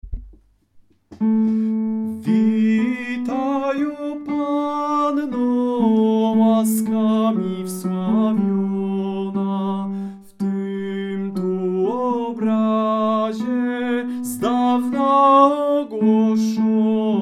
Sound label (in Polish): tenor; Witaj panno